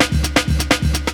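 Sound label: LOOP snare drum, Drum & Bass, 130 BPM; Ueberschall; Drum & Bass Resonance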